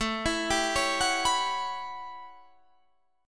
A 13th, Guitar Standard, chord; arpeggiated

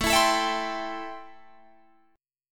A-Minor 7th A Guitar Drop D

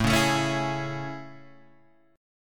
A Major 7th; AM7 Chord {5 4 7 6 5 4} strummed

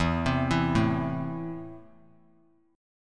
E Suspended 4th Sharp 5th; arpeggiated; JGuitar

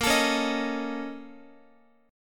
A sharp Minor Major 11th, down, Guitar; strummed; 4F,4A,5C,5G,5C,6E; JGuitar

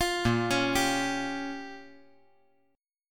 A sharp Minor 6th A sharp down, chord; arpeggiated; JGuitar

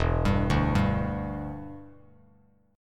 E Minor 7th Bass Standard; 0,10,0,0; arpeggiated; JGuitar

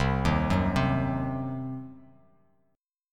C Diminished C, Bass Standard; arpeggiated; JGuitar

